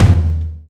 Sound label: kik; drums; virtual drum sounds